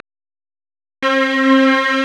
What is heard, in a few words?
STRNG; CMP - Analogon